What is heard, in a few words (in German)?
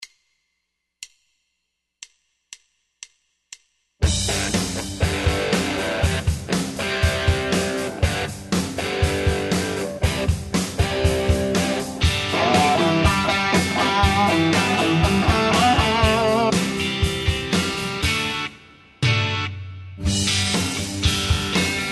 Bass lernen Einführung Rock Playalong